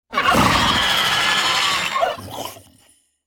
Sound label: Velociraptor dinosaur roar attack